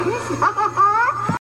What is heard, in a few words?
Laugh; Oracle